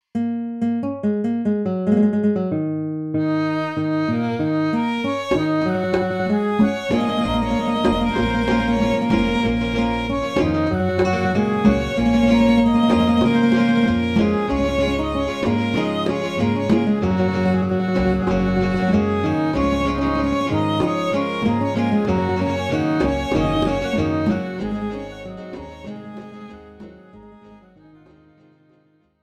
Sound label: Eb Dorian flute music